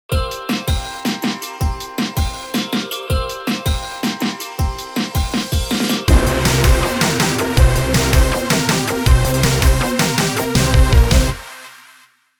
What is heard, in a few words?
jingle